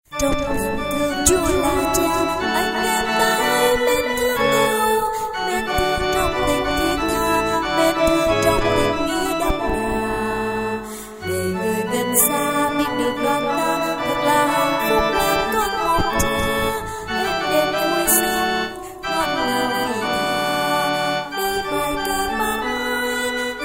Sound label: sop alto